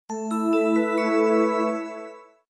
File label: SMS tone